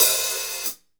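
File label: hat open close, drum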